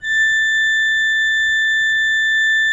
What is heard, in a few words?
A5, Cathedral Organ, ITAL.PRIN.; Propeller Island